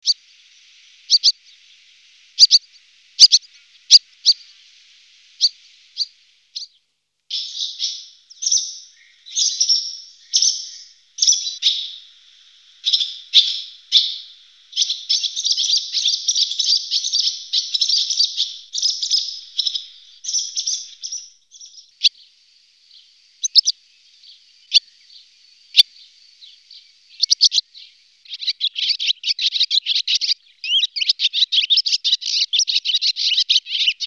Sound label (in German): Rauchschwalbe